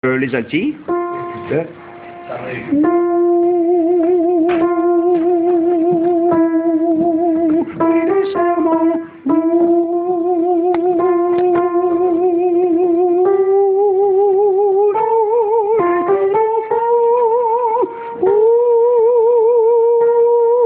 alto

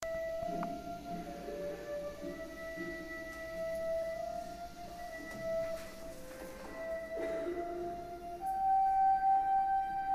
chant

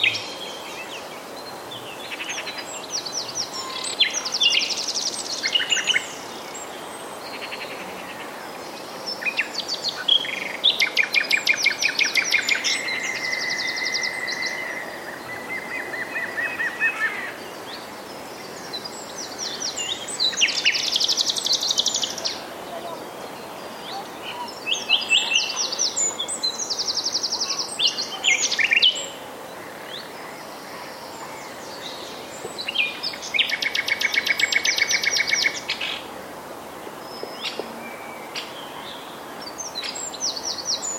rossignol troglodyte

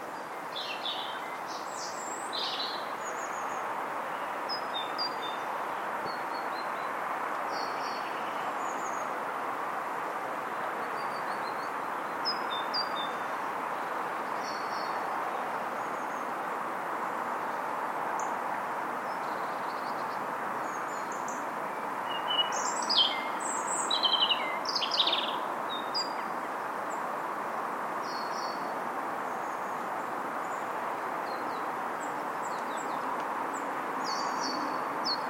merle rougegorge

